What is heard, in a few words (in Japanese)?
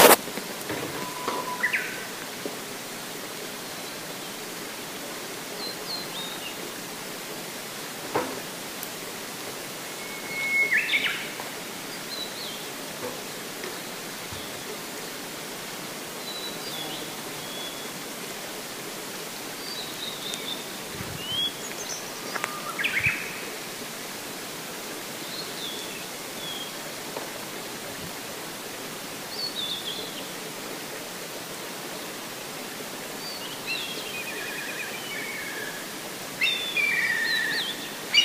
鳥の声